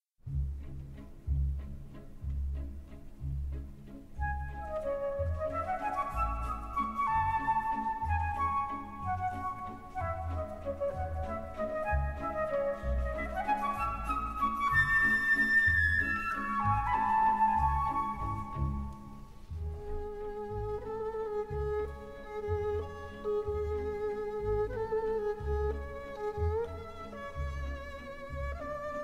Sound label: Echo of Waltz; Classical; Georgy Sviridov; The Snowstorm; The USSR TV and Radio Large Symphony Orchestra; Vladimir Fedoseyev